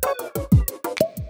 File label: Descending Boop; BB 2HTC Samples Vol 4 Addendum; Weird